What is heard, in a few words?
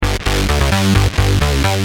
Bass, Industrial